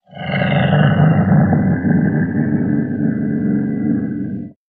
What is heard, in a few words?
Ocelot Growl Clenchd